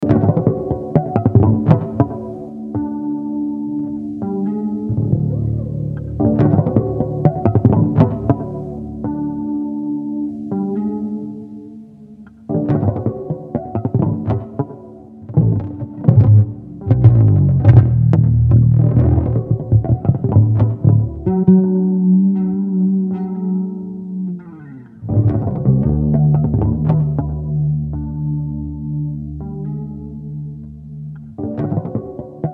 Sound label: bass